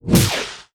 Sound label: Beam Knife, Weapon